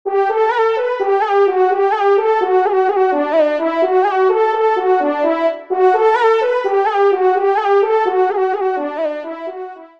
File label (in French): trompe; Chasseresse a l'arc d'or; Bas Rhin; Pupitre de Chant; Ton de Vènerie; Dédicace : à Artémis; Localisation : Alsace (Bas-Rhin)